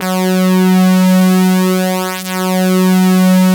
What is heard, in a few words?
SYN Ana Lead; Best Service Gigapack 1